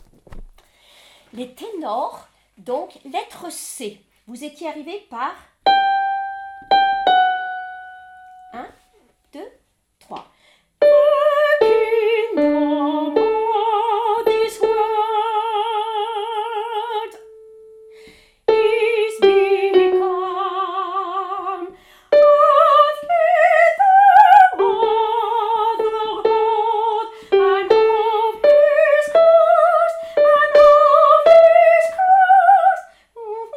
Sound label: Tenor; Hallelujah Handel; Ensemble Vocal Chanteval